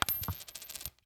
coin; 99Sounds; Sound Design Tools; Raw Foley

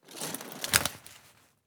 Umbrella, Compact, Close, Slide, Fold, Snap; Sergey Eybog; Automatic Umbrellas

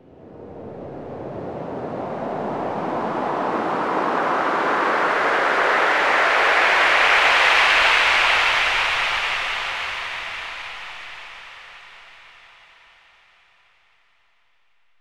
Wet Sweep, White Noise

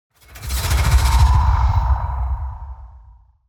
transitions sci fi movement; SoundBits

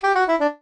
jingles SAX; Kenney's Sound Pack; Music Jingles

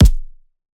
Punching Perc, Straight Glove; 99Sounds; Punching Percussion

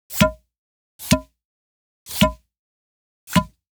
Pop Toy; Toys; Mechanical Wave

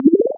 maximize, Interface Sounds; Kenney's Sound Pack

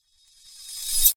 Sword Sound; FXHome; Fighting Sounds

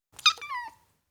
Ten Week Old Kitten, Individual M, Cats; BBC Sound Effects Library

